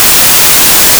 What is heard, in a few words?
noise